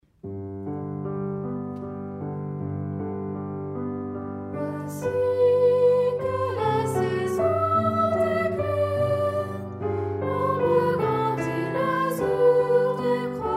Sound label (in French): piano chant